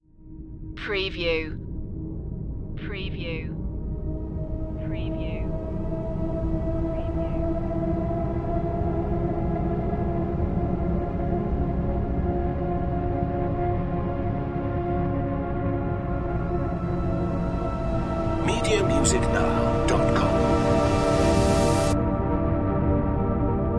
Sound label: resolved ending dry